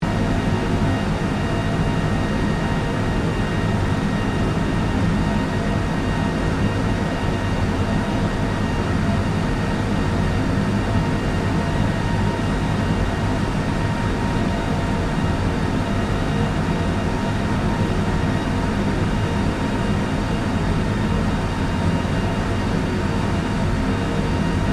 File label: MACHINERY INDUSTRIAL REFRIGERATION PLANT OPERATING STEREO